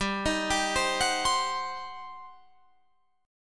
G Major 7th Suspended 4th Sharp 5th, Guitar, Drop D; 17,18,17,17,19,20; down